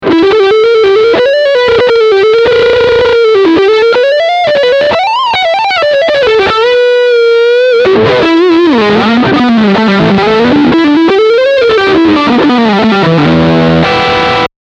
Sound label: Leadline Front Humbucker